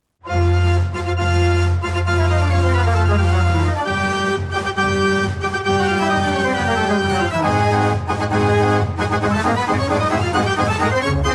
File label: gladiators, band organ